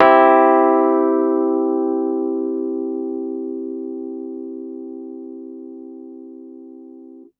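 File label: Piano Rhodes major hard; TrashLibrary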